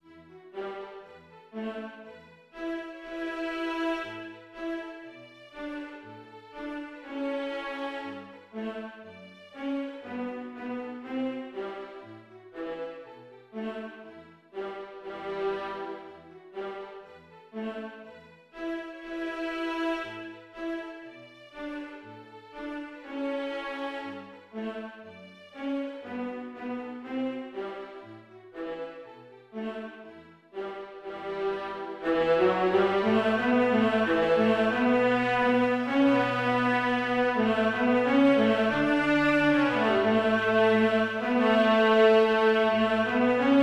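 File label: Bartok Braul Strings Viola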